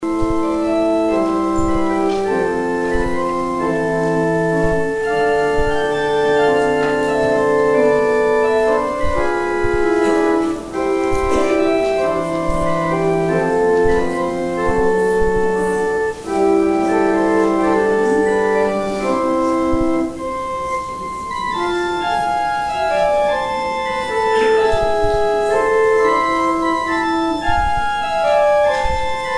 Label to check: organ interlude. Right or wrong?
Right